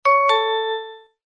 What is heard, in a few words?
Ding Dong, message tones; KM570 Cookie Gig; Default sounds; LG